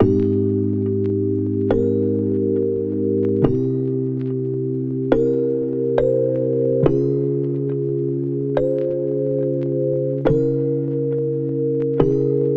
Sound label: Lofi Melody Loop - 70 BPM A Maj; Cymatics; Oracle Sample Pack